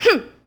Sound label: Boxing Female Punch Grunt